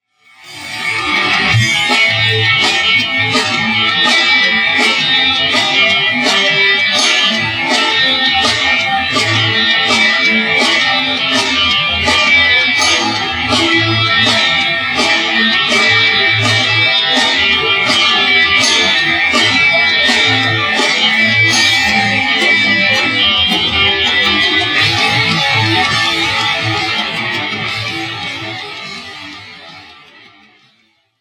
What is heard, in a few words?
The Main Rhythym Guitar